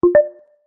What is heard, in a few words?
Notification; Realme C67 4G